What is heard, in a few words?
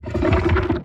lantern soul